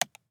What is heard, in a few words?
Switch Light